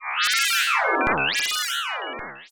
Science Fiction Sci-Fi Electronic Computer Malfunction